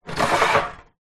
wooden sliding toilet roll storage tower slide open or close; household